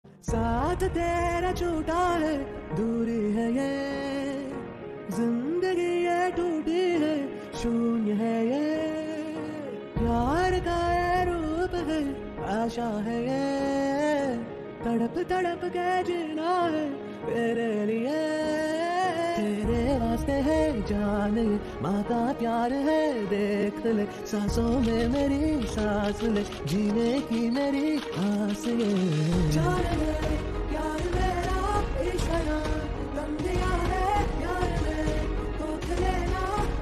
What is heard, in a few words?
sad ringtone song